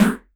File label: Elektron Monomachine, Drum Machines